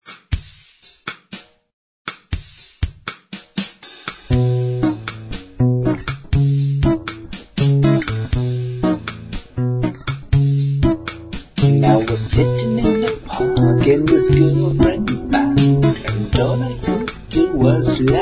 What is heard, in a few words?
laughter